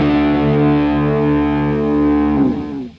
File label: guitar a bar chord distorted